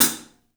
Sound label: 3D Drums, TIGHT ZILDJ; 3D Sound